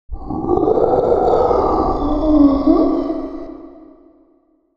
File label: Zombie Roar